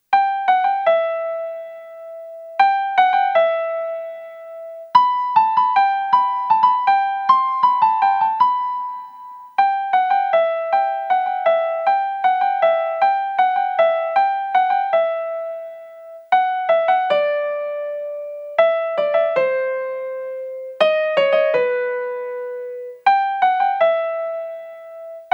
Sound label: flute; what child shal come